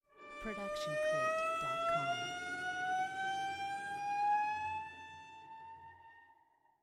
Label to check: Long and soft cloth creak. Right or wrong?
Wrong